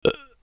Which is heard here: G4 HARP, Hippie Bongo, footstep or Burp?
Burp